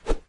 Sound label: hand swing